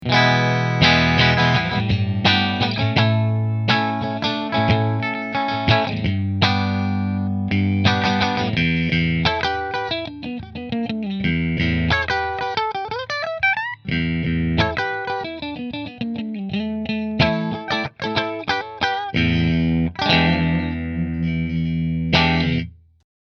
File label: Nola Voodoo Bridge Middle Fender, guitars; New Orleans Guitars Voodoo Custom Quilt Top